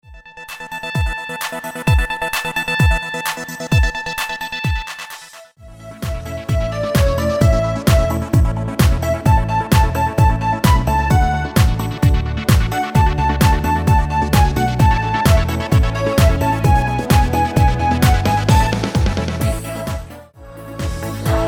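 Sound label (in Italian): Remix Ballo Di Gruppo Versione Donna Canto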